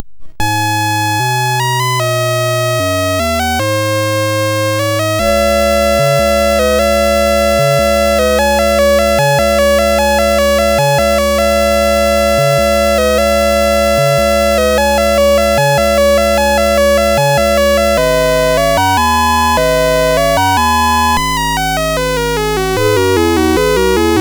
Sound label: sid MOS6581R4; Lunattack